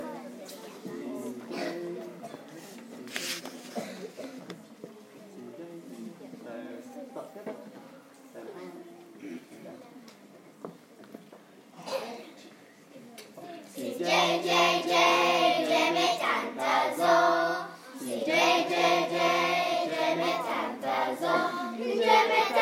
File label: choir; Choir songs from Avanti Gardens “Roots” concert in March 2023